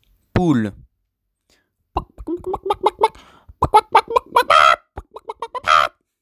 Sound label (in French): poule